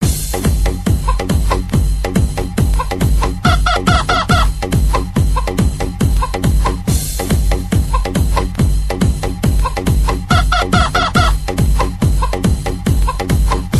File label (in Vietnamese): Nhạc chuông gà gáy remix